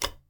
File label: bathroom